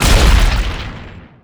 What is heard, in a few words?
Blood Splat Gib; SFX Kit; Foley - Misc